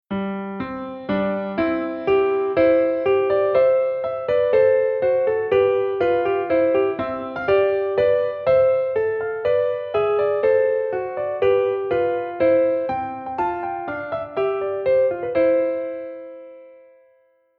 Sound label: Fanfare In C Major